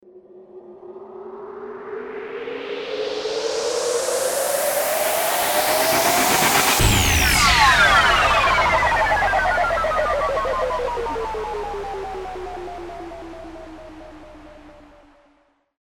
ELECTRON BURST RISE IMPACT

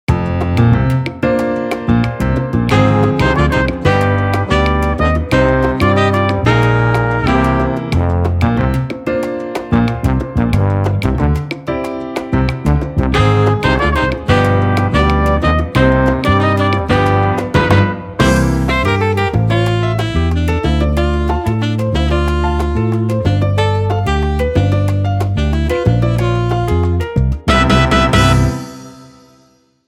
MELLOW MELODY

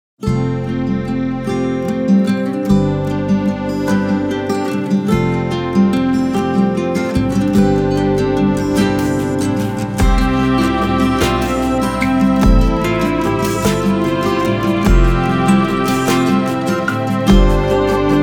(INSTRUMENTAL), welsh language singer songwriter; acoustic folk

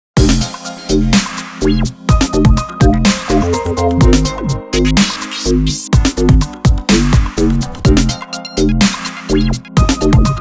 halftime dub mix

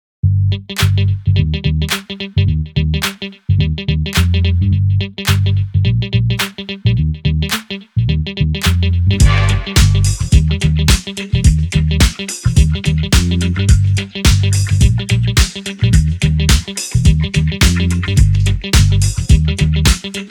instrumental

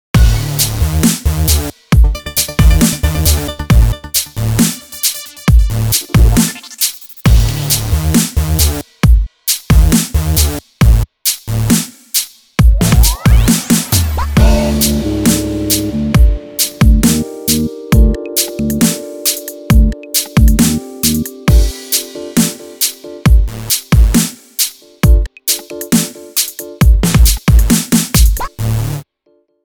halftime dub mix

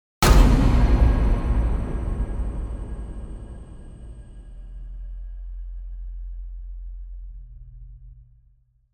MONSTER SCAPE IMPACT

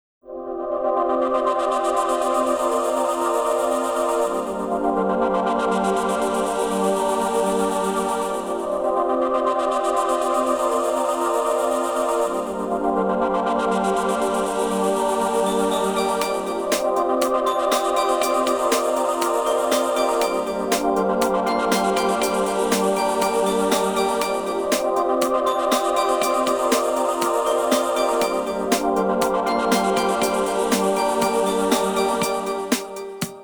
TENSION BELLS VOICES

